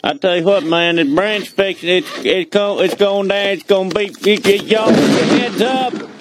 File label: BOOM